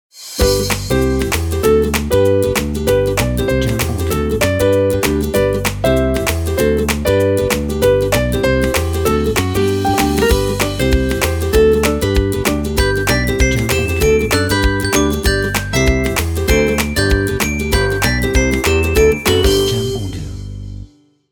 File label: Upbeat Happy Intro